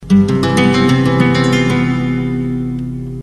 guitar

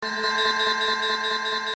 bell

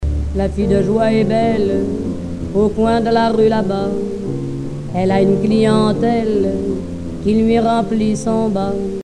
acordeon; El acordeonista

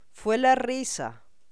la risa; fue la risa

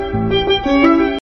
violin

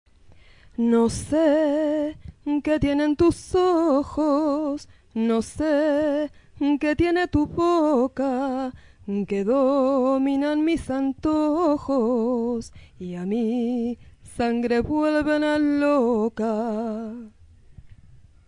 Canto

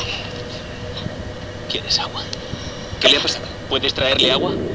agua

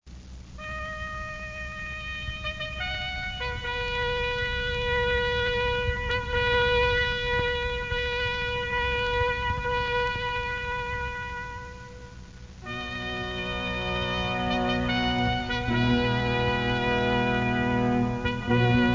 himno deportivo; pumas

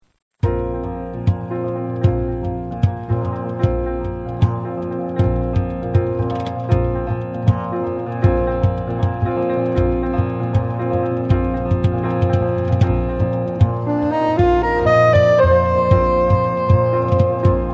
tambores

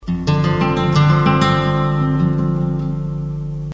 guitar

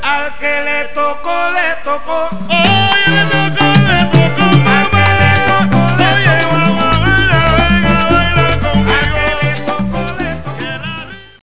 ritmo, tumbao

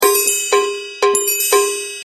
bell

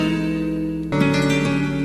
guitar

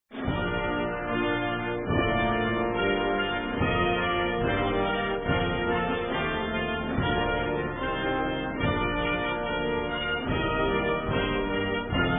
marcha real espanola

